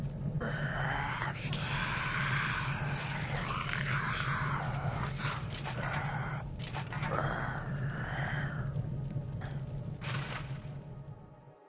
death general idle

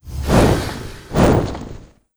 wind peregrine main, monster

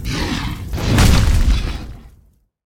dragon boss; monster

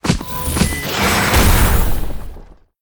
Boss Mech Bull, monster

